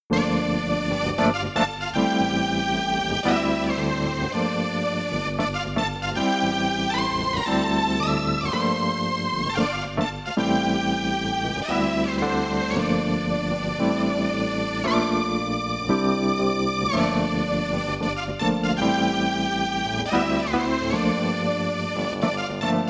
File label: Synth Lead; VIVO S10